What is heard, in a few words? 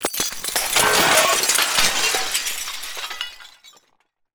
falling glass debris; Bluezone; Bomb Blast Explosion and Debris Sound Elements